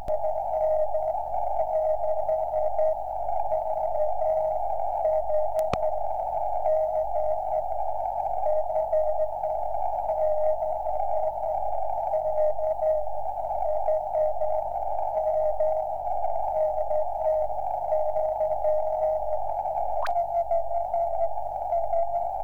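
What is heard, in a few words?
1296 MHz cw; 1296 eme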